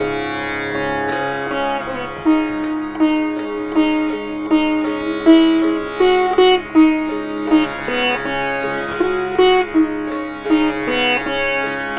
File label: Sitar, East Indian